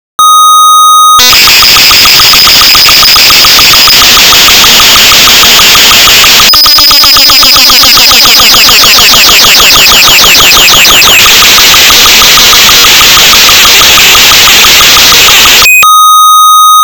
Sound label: grids latin; patterns